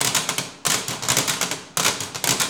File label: TESLA, Science Fiction